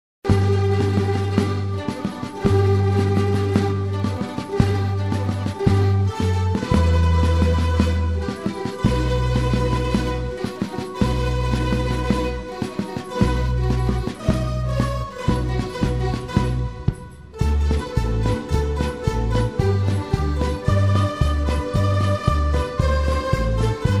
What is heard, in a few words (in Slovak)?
fanfarovy pochod